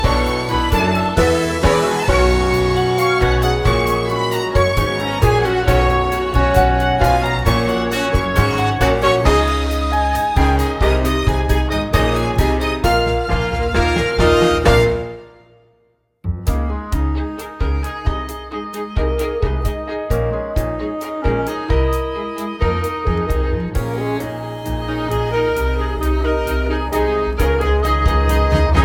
Instrumental

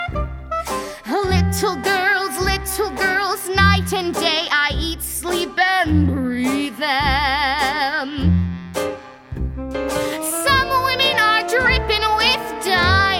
Little Girls Audition Cut Vocal

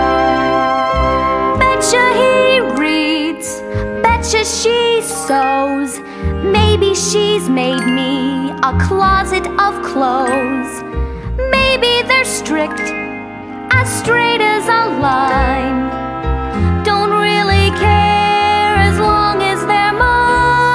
Vocal

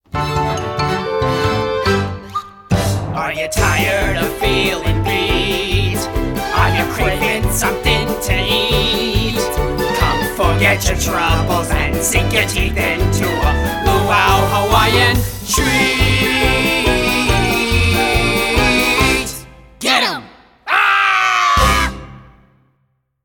Luau Hawaiian Treat

